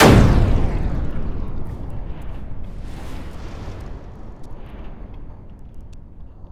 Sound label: EXPLOSE, Disjointed Textures; USB Soundscan vol.33; AKAI